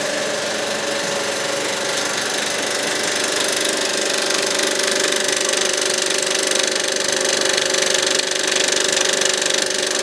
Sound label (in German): Sound des 35mm Projektors